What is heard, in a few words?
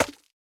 Slime Impact Small